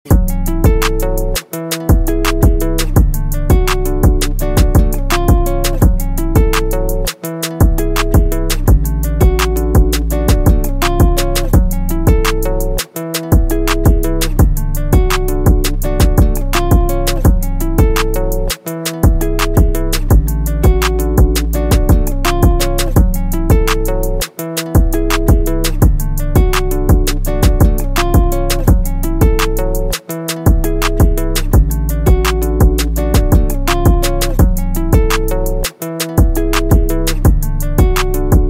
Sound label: instrumental ringtone